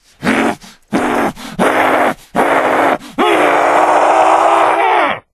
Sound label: pain fire